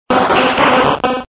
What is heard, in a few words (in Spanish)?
Grito; Kingler